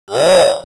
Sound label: Retro Roar LoFi